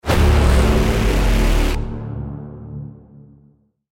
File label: deathcam